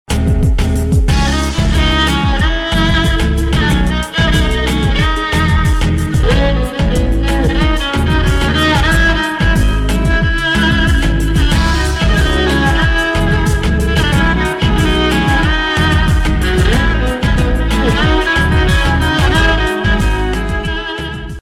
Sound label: Violin Music Tone